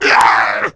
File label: pain